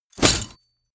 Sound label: chainmail impact